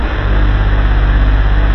JV HEAVY DUT, JV-1080; Syntec; Wall of Sounds

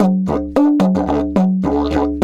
108, Didgeridoo

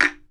BAMBOO, World Assortment

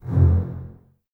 Basses F 1, String Ensembles; Miroslav Vitous; LINKS ORCH

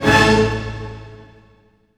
orchestral hit; Orchestral Loops

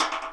REPINIQUE

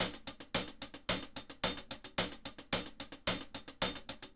Hi-Hat Groove; Hi-Hat Grooves; Sonic Foundry (Sony Creative Software); Vital Drums

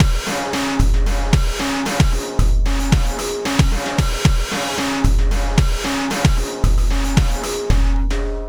Beat, Drums, Eclectic Electronica

